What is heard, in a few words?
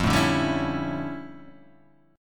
D Minor Major 11th, F